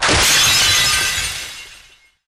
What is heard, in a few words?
Break; SE Ing Other Rule#05 (SE Bigbang04 Break)